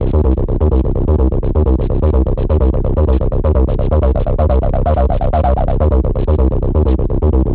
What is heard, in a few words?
hihat low